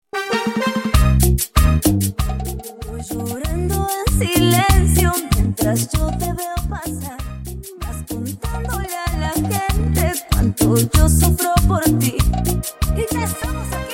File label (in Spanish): Cumbia 96 bpm